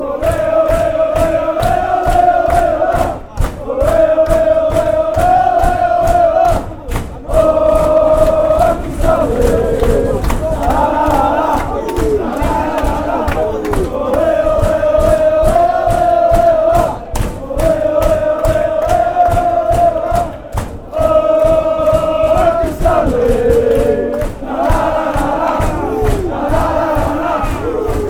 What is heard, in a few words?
ole ole, chants; accrington stanley